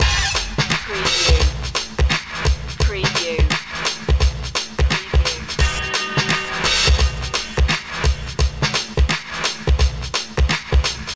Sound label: celtic requiem loop